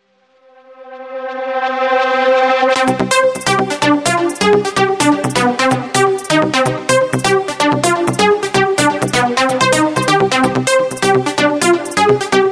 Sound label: dance ringtone